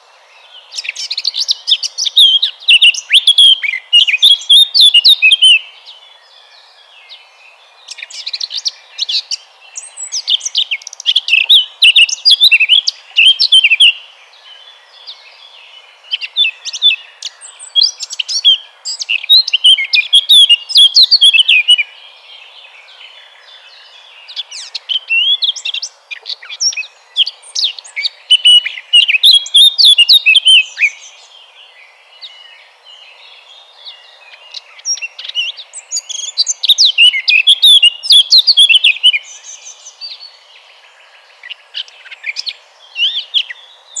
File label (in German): moenchsgrasmuecke gesang; vogelstimmen; nabu; lars lachmann